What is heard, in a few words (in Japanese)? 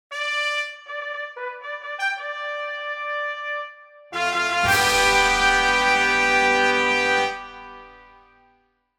ファンファーレ